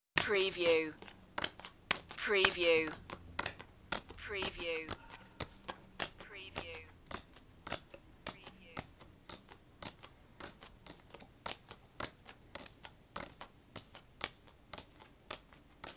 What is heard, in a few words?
Dealing Cards